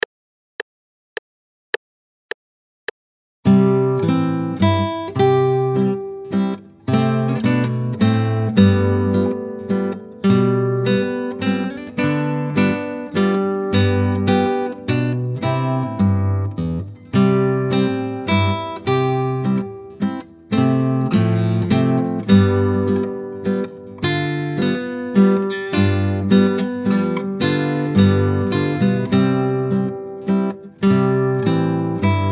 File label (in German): Walzer Landler Walzer